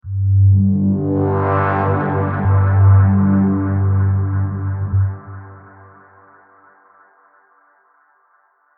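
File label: Pad G major 90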